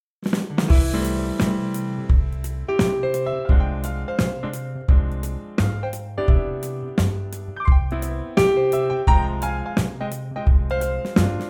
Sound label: funky C; xmas female; key - C - vocal range - G to A (optional top C); acoustic TRIO arrangement